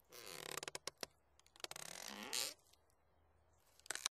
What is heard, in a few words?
Coffin